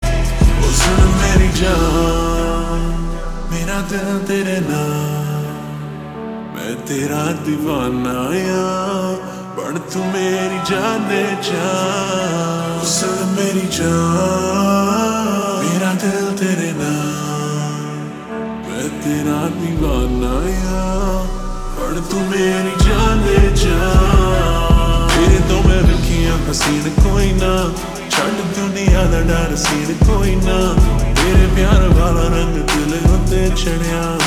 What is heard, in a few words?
Ringtone Slowed Reverb